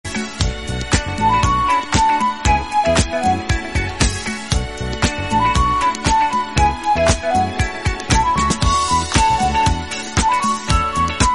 morning tone

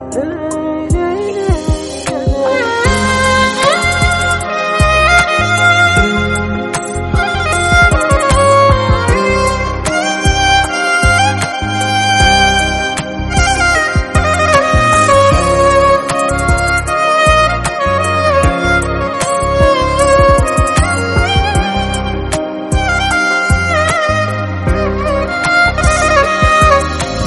sad instrumental

